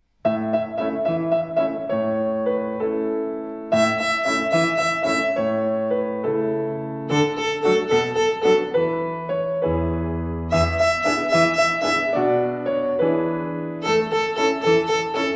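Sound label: Rhythm on E and A Fourth Twinkle Rhythm, violin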